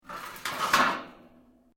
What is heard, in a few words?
METAL PLATES MOVE; Metal (Sound Effects:Materials)